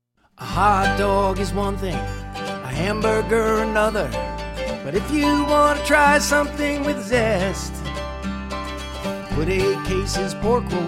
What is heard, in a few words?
Pork Roll Jingle